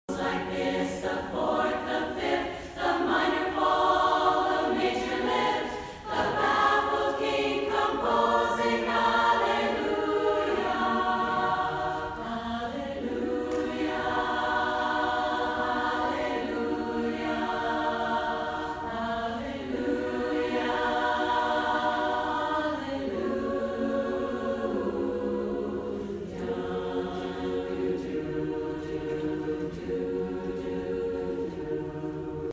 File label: London Chorus